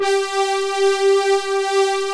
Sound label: PADS; Pro-Rec - Synthsonic; Z1 PADS